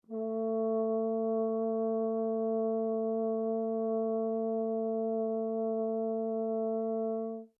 HORN A4 P LONG, French Horn in F; Alexander French Horn in F; Horns (Sound Effects:Musical)

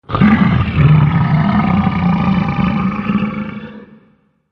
Massive Dragon, monster

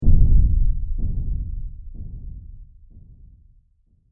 A Distant Explosion With Subtle Delay; Bomb Sound Effects; Andrew Fleming

